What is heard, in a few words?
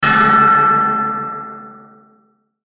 Metallic Metal On Metal Scrape; Metallic Sound; Metallic Sound Sound Effects